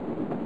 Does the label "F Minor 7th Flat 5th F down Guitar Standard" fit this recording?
No